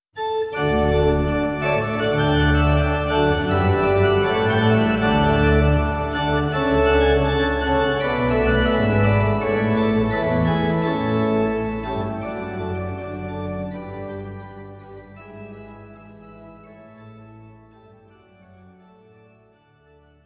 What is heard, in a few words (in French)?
Orgue